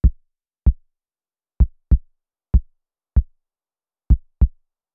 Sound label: kick processed